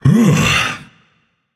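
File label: non-verbal sigh; smite; atlas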